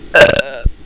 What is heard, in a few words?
BURPLIG